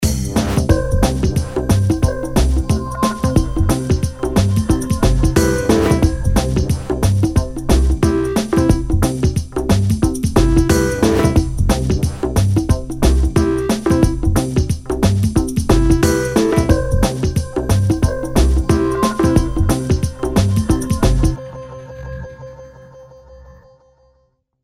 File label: Jingle On TV